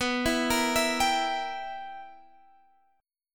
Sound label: B Major 7th Suspended 4th Sharp 5th B down Guitar Drop D